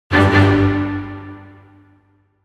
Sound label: Battle Complete Jingle; Metroid Prime Federation Force